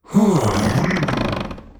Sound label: TreeOfKnowledge Death